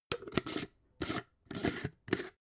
jar glass plastic close slow

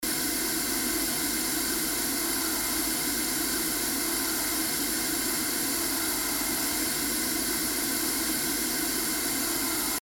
gas streams warm sharp

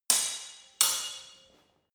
sword hit fast sword double

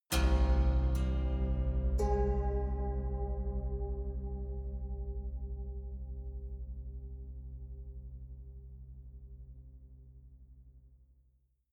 haunted strings dark omen soft

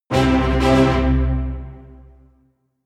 jingle brass hit roll out

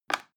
plastic button little release regular

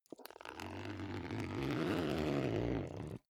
zipper backpack open slow

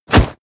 hit armor leather

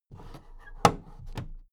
big plastic case put down

